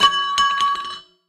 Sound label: cannon shell drop